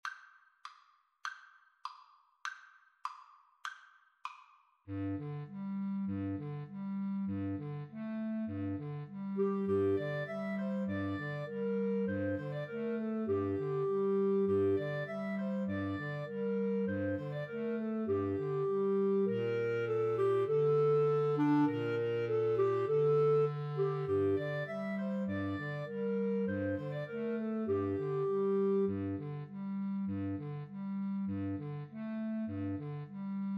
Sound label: Clarinet Trio